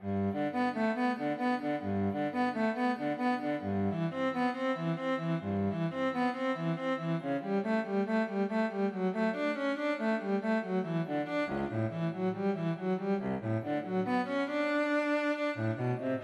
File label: prelude cello suite